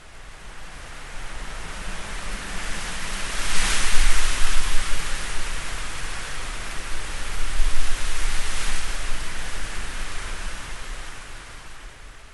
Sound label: Amb Weather; Red Orchestra 41-45